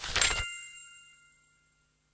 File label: new upgrade; Atelier